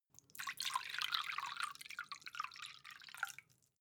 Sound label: mug pour; soundpool